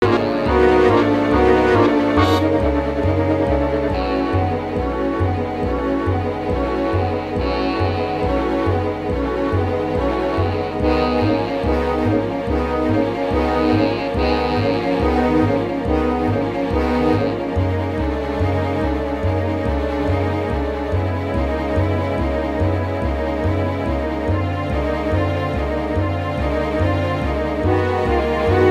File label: Instrumental Track; Amy Callback; auditions